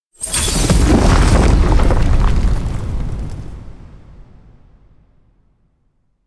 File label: world collapse; anemoi